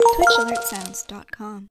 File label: Arcade Cheer